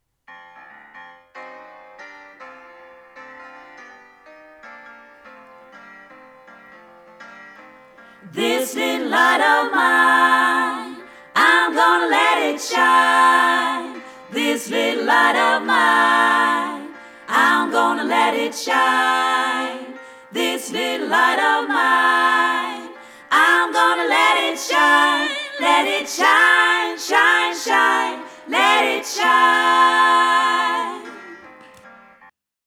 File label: This little light of mine - alla stämmor